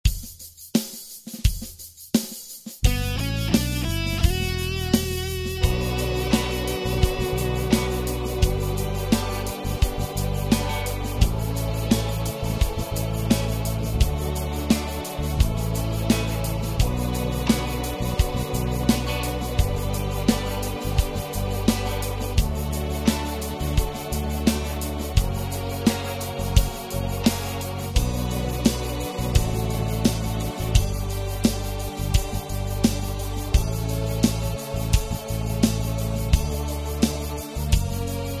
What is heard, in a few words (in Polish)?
backing